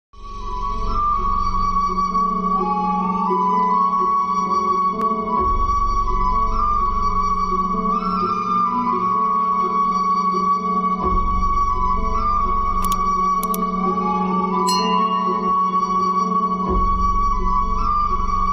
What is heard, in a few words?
sad ringtone bgm